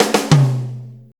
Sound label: 90 BREAK, DRUMS